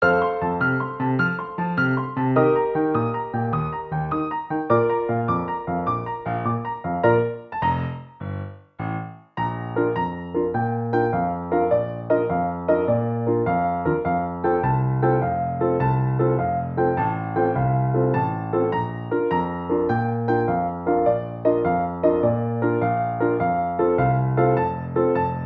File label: Backing Track